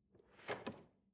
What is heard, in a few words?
drawer large draw soft